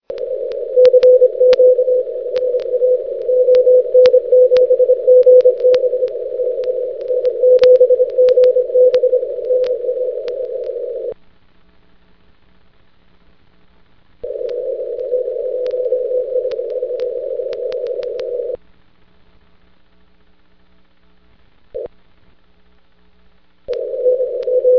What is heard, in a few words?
6m; ON7GB; 6 Meter; N1BUG